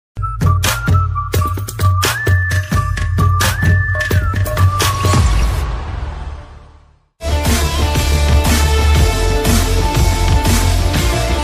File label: bgm ringtones suspense bgm; mosagallu; manchu vishnu; telugu movies